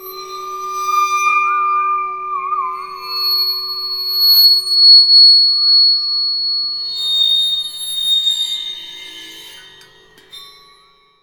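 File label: meet ghost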